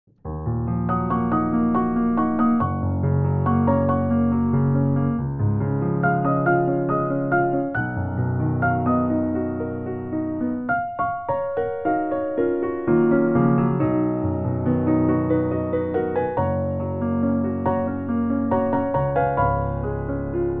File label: Piano Solo